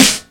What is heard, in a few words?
Nice Snare Drum Sample C Key, steel snare drum tuned to the C note